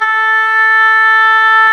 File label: OBOE; Sound & Vision - Gigapack I CD 2 (Roland); Brass&Woods1